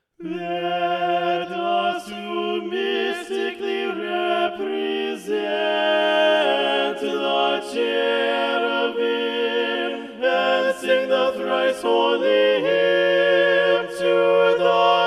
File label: Cherubic Hymn simple form SATB Balanced Voices; Paul F. Jernberg